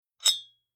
Bar Whiskey Glass Liquor Decanter Fast Open Glass Scrape